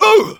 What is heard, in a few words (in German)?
pain sharp; Engineer